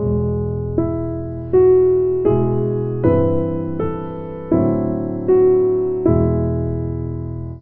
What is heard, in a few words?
piano